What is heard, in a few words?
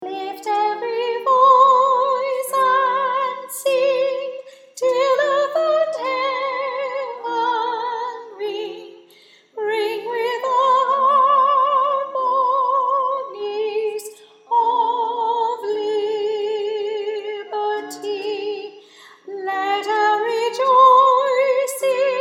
soprano Lift Every Voice